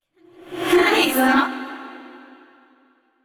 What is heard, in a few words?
laugh; yamiko